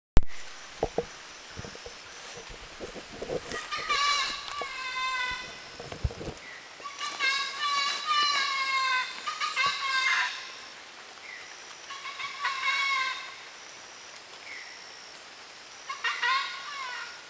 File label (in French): oiseaux; Mes treks